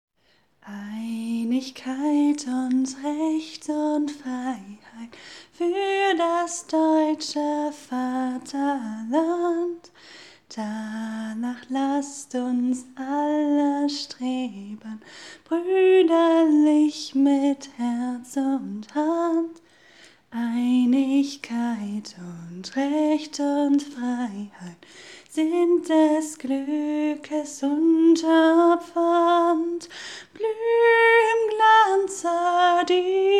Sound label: German National Anthem